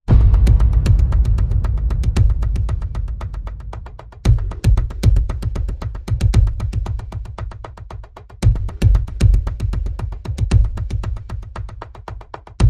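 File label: percussion only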